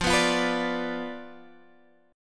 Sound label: B Diminished F, guitar